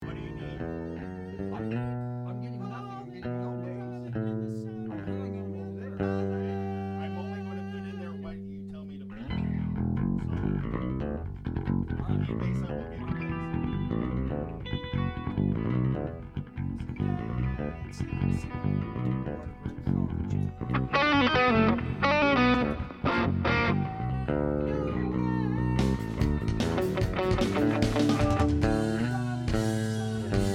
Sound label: Strings Only